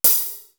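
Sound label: TRASHY, cymbal; CYMB.HH; East West; Global Sounds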